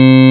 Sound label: android, Digital Synths